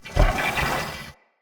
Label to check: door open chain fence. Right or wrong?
Wrong